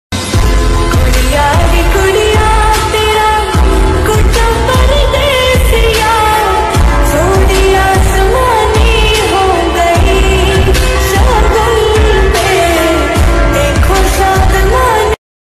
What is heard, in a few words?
lofi love ringtone